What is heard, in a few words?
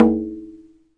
arabic perc, Arabic Percussion Kit